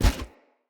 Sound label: Foley Armour Step